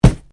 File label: PUNCH; Various Organic Foley sounds; Punches and Hits (Sound Effects:Combat); SoundEffects-Foundation4